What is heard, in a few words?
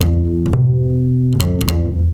Upright F, Basses; Total Drum&Bass